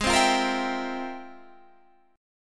F Minor Major 11th

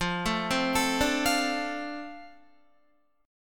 F 6th, Guitar; 12,12,12,14,14,13; 4F,4A,5C,5G,5C,6E; arpeggiated

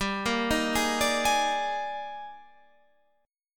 D sharp Major 11th, G, guitar chord

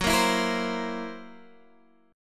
C 7th Flat 5th Gb, chord

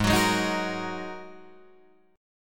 G sharp 7th Suspended 2nd G sharp Guitar DADGAD

